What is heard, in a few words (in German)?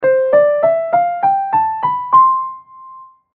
C dur 2 okt